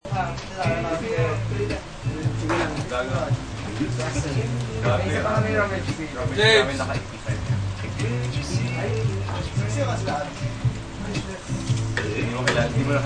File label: frying egg with talking